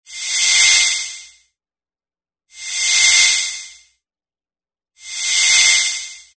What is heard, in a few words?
radar low sweep